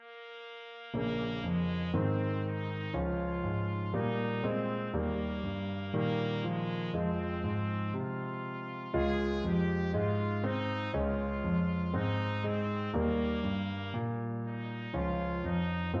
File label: wondrous TPT, trumpet